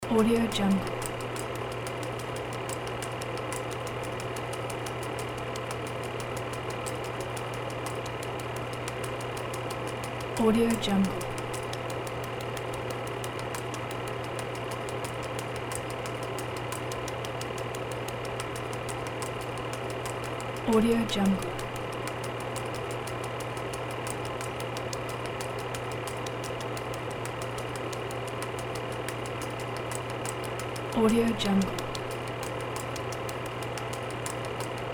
8mm film projector reels runs out flapping continuous; paulrich